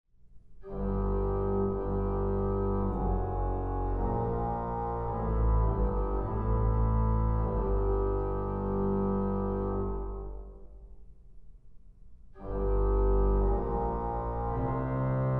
Bach Nun komm der Heiden Heiland hymn tune only; BWV661a

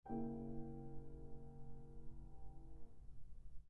dim chord; Schumann Kinderscenen 1

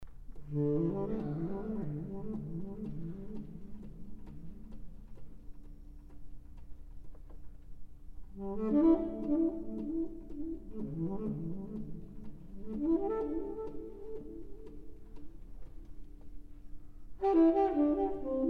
improv hymn What Wondrous Love Is This sax

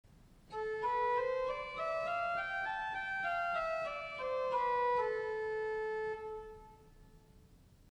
Aeolian

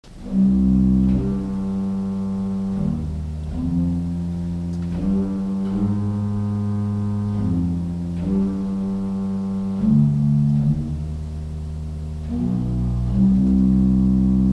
Organ Pedal Gemshorn 16

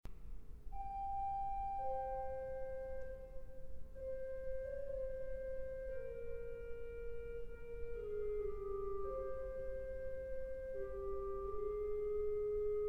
organ; Mendelssohn; organ sonata no 1 in f minor; Andante recitativo

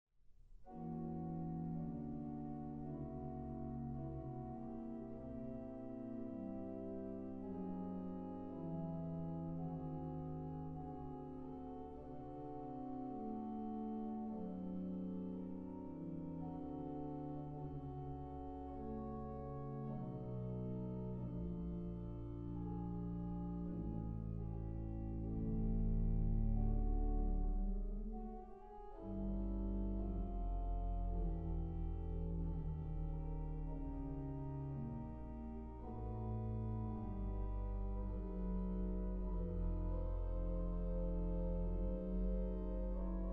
Franck chorale 2 pedal doubling

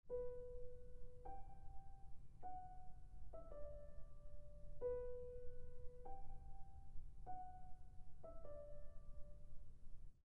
Schumann Kinderscenen melody only

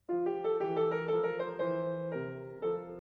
elegant triplets; Inside Mozart K5

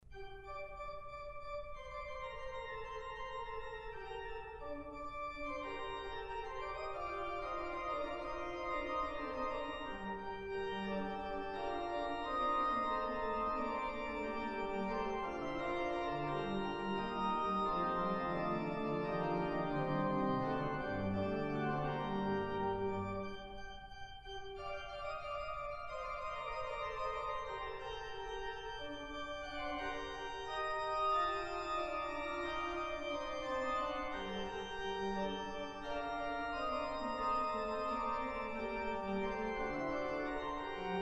Fugue section from Buxtehude Prelude in g minor; BuxWV163

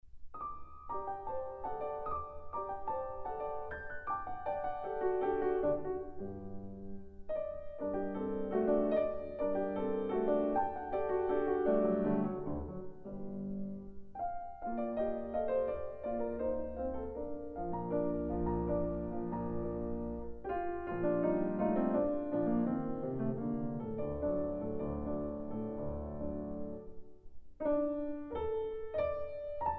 Grieg Lyric Pieces Norwegian Dance

